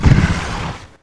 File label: walk, monster spider king